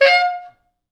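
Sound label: tenor sax noise fx; East West; Quantum Leap Horns Sax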